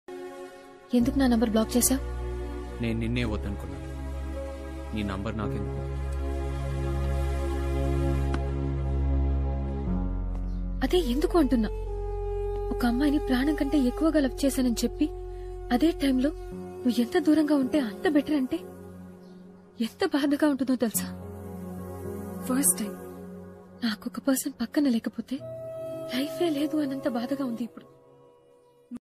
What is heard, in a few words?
telugu love failure status, heart touching; shailaja reddy alludu